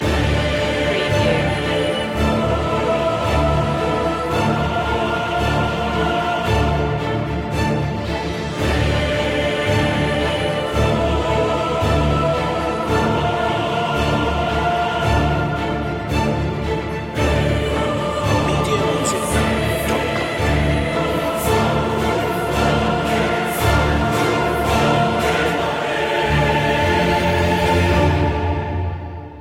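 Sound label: no percussion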